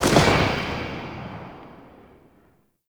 Infested Burst Rifle Tail, weapons

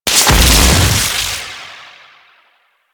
Beam Blast; Warframe; Captain Vor

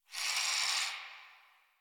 Tree Critters Angry; Ambience; Props; Warframe; Duviri

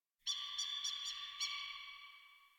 Tree Distant Critters; Warframe; Duviri

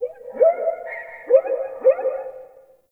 Forest Open Glade Animal Far, Day; Ambience

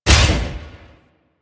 Garage Door Close; Ambience; Warframe; Venus Open World

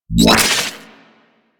Deth Cube Item Drop; Warframe; Sentinels

